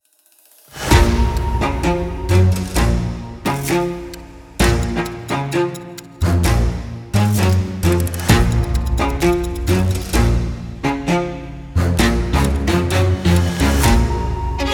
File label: detective epic chamber strings; Dragon Mystery